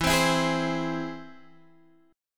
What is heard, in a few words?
Ab Augmented, E